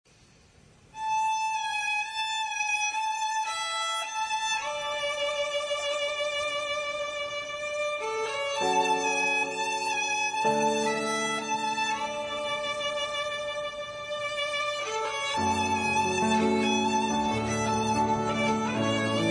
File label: violin cover